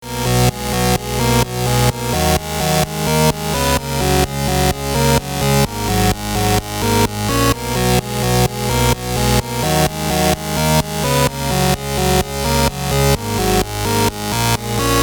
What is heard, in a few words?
Melody Loop 128 Bpm